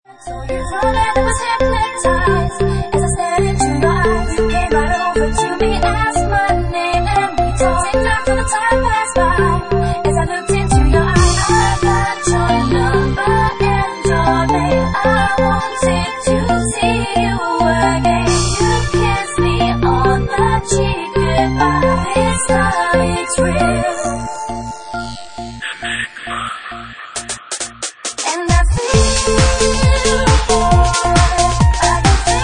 Organ Remix

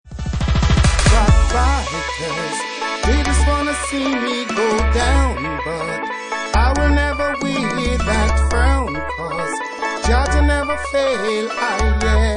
Organ Mix

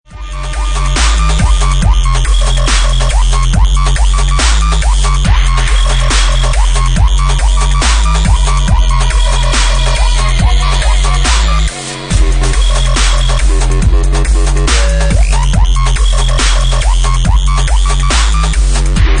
Dubstep Remix

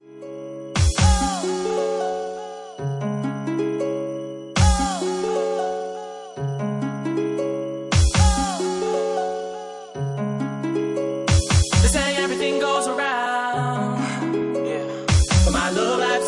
Bassline Remix

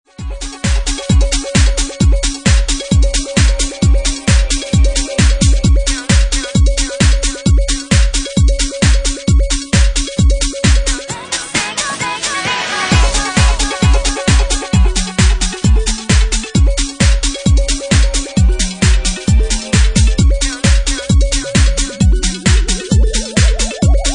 Organ Mix; Ecko Records

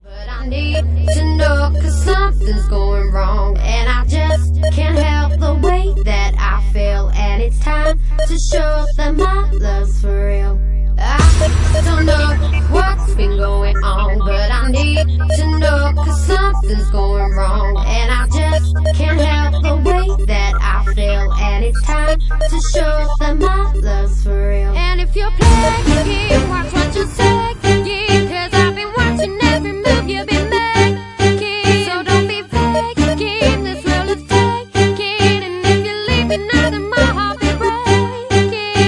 Bassline Mix

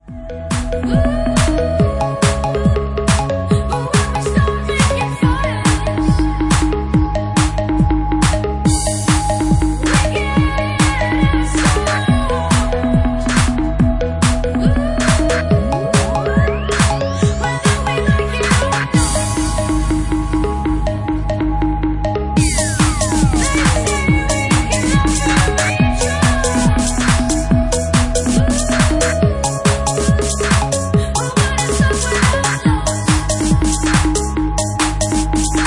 Organ Mix

